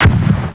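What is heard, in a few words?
fireball; DAoC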